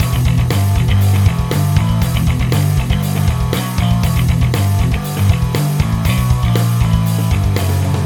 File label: bass